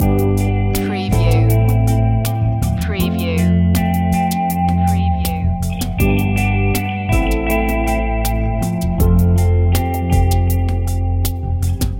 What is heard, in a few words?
chill out love loop